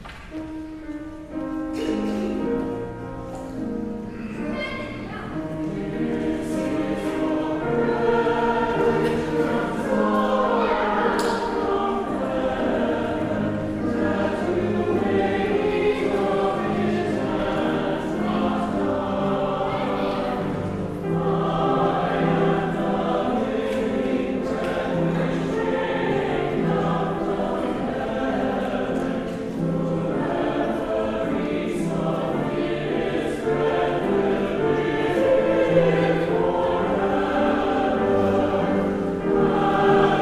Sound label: Choral Scripture Antiphon